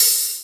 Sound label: ROOM HAT; Big Fish Audio; Synth City